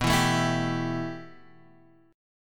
B 7th Suspended 4th Sharp 5th, Guitar, Half Step Down; B7sus4#5